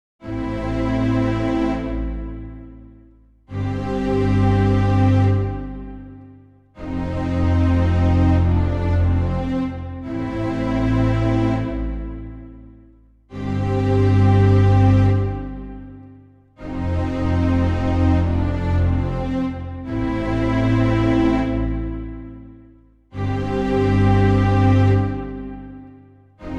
violon violins

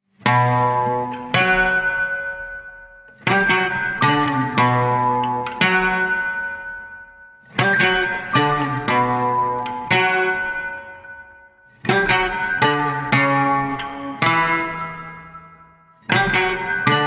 guitar celesta

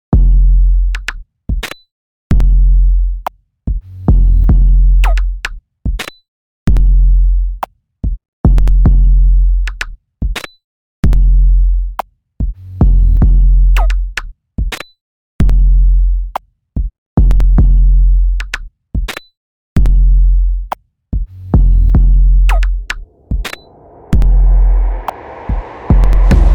drum and bass

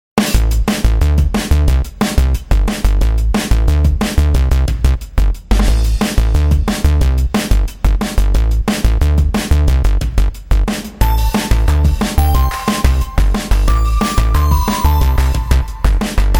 happy stranger drumbass

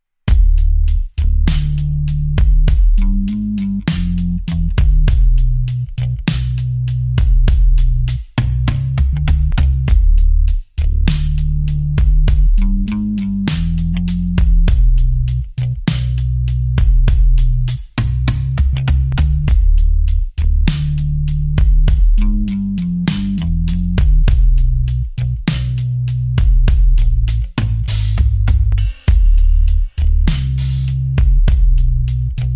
drums bass

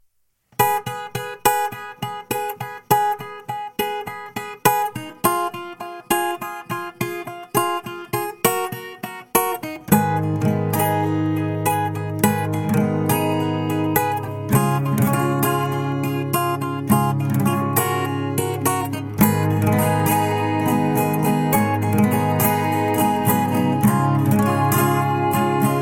guitar

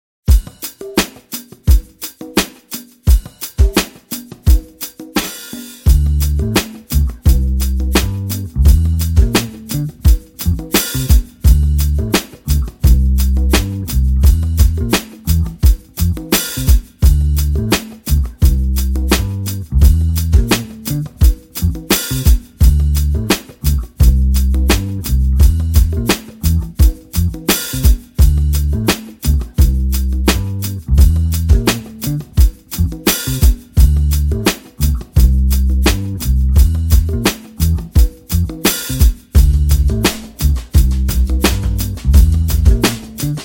Snappy Clappy drums bass

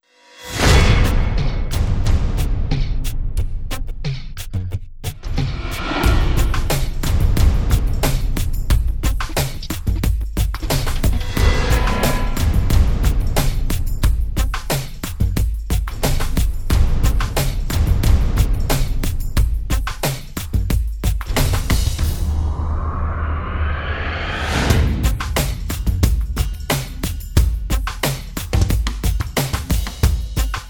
kick attack drum

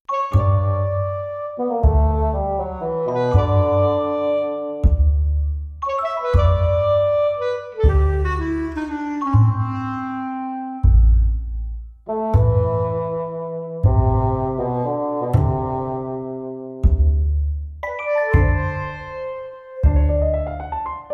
a funny gardener oboe

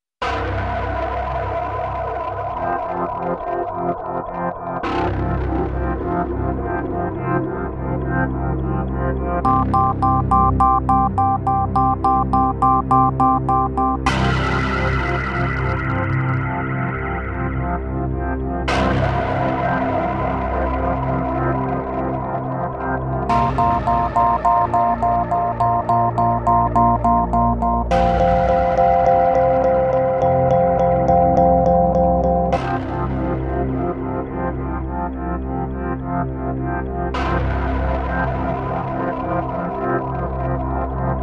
floyd rush ambiance

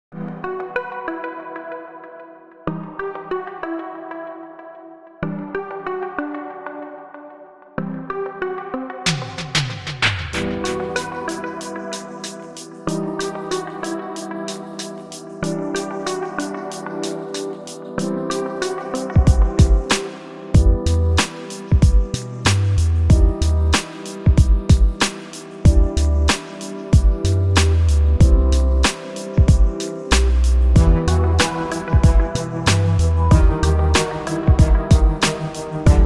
no choir

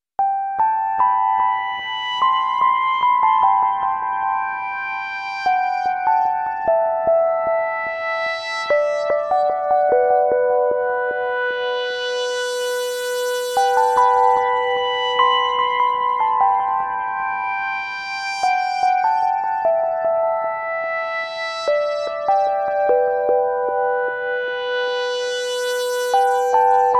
final melancholy electric synth

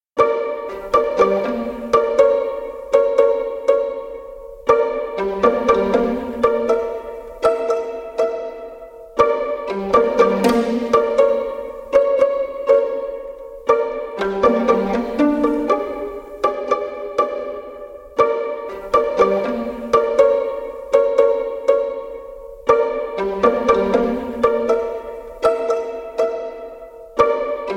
Pizzicato

